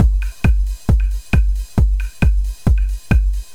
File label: Drums Techno